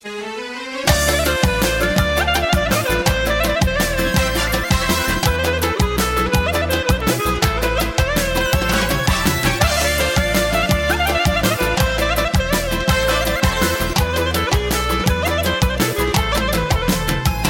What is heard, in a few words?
Kavkaz Armenian